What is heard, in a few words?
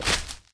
Grass step